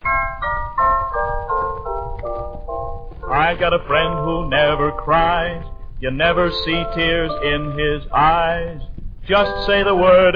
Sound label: Laugh Laugh Phonograph